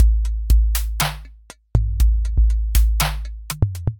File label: Kit, Drums Synthesized; Mixsitter Kit; Ableton; Factory Packs; Drum Essentials